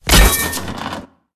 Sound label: Character Body Impacts